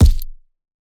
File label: Punching Perc, Straight Glove (Chain); PROCESSED; 99Sounds; Punching Percussion